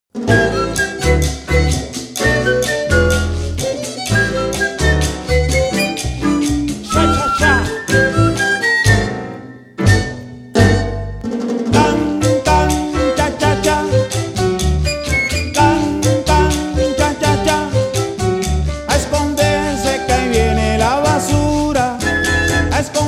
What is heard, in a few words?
Charanga